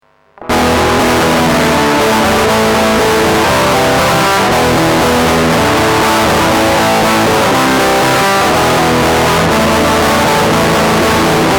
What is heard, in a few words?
dist test